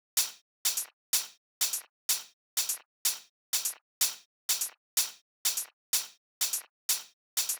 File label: Hihats, 125bpm Dm, Nu Disco; Zero-G; Construction Kits; Speedy